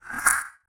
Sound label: alien, creature